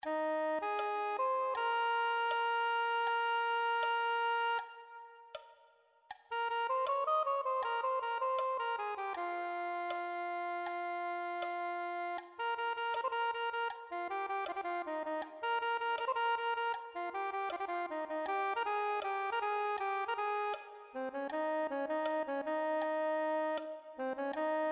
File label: soprano saxophone